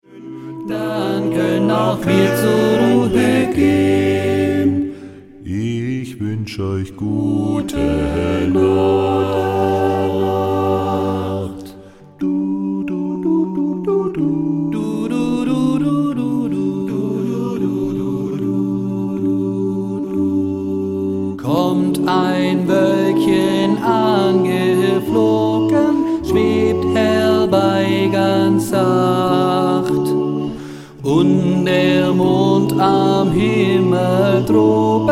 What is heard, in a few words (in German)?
Schlafliedmedley; musik